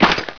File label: player sand